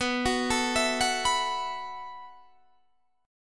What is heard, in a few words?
E Suspended 2nd Suspended 4th B